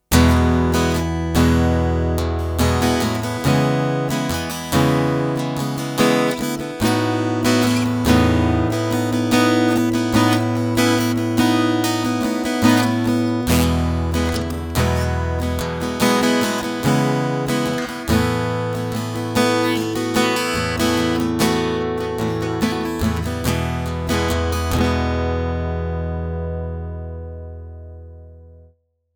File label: Clean Strumming Line EQ12